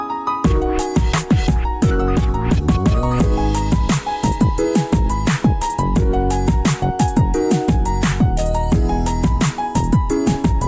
Lovers Piano

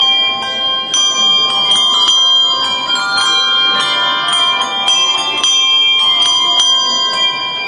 Bell Tones

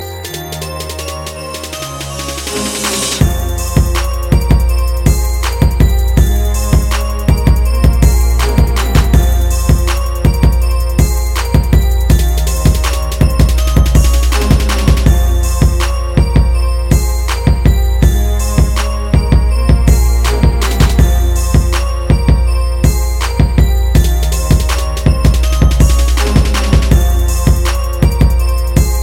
Bass Ring

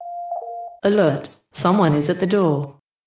Door Chime

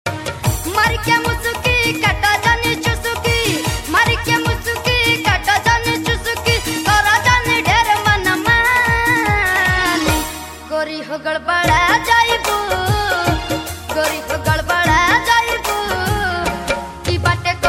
Bhojpuri

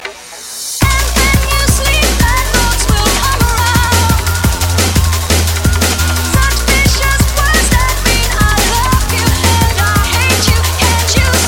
Dnb

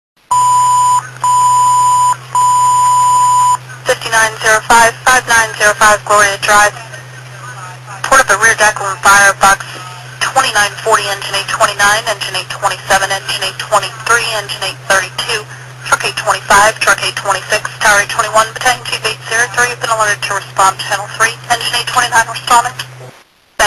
Box Alarm; Pg County

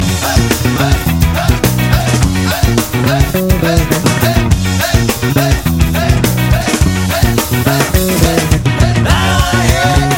Skapunk

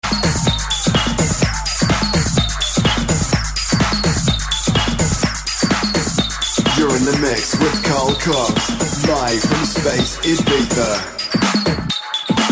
Electro

